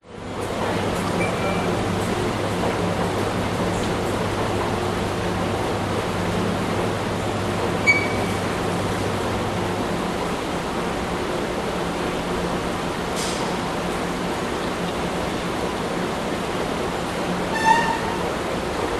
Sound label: public places train station metro no trains fans few people sydney australia